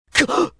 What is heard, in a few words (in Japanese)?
dame jaku, ダメージ弱, 優等生タイプ02; 優等生タイプの真面目主人公系。; 知能系優等生。; ゲーム用フリー音声素材集; 特徴は薄そうなので、変な武器とかで味付けるといいかも。; 設置系でいやらしく戦ってほしいですね。間違ってるのは俺じゃない、世界の方だ。